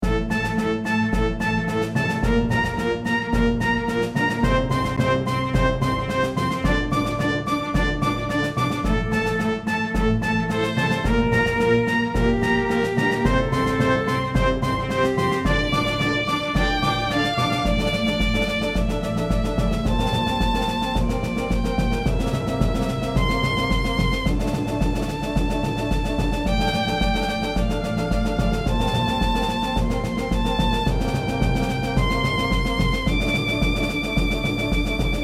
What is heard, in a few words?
Orchestra